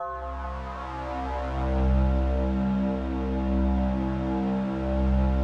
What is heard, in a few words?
PAD, DIGI-PADS